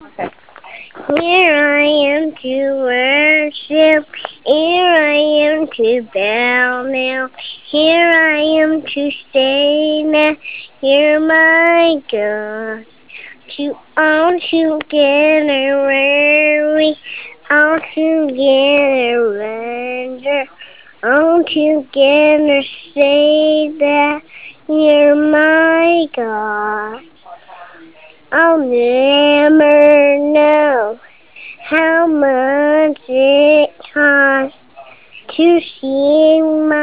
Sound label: Singing